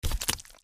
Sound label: trog foot